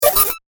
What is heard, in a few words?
Scifi Laser Charger; science fiction sound effect